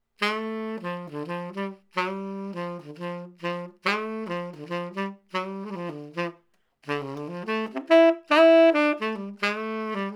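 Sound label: Mid Side Sax; Four couples of microphones have been placed in front of some instruments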